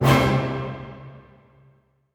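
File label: orchestra; Masterbits - Switch FX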